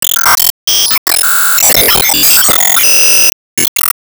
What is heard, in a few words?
Sys Topleague Reward Ranking 2nd